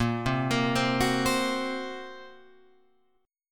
A sharp Suspended 2nd Sharp 5th A sharp, Guitar Open F; arpeggiated; A#sus2#5 chord; JGuitar